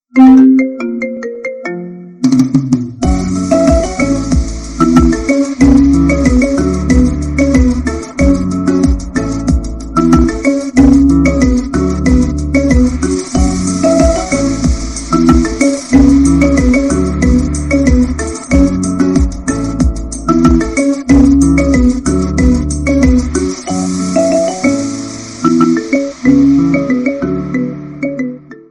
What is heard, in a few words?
Marimba Remix iPhone Ringtone; iPhone 13